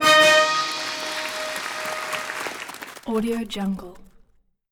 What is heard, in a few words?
settlement alert victory trumpet brass orchestra; empire game